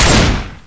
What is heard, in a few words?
fire 12 Gauge